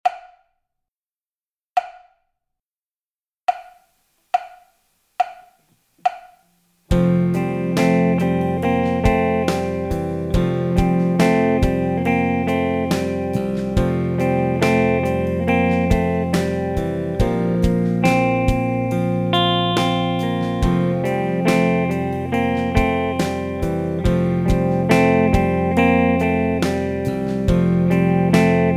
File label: F Major Chord Study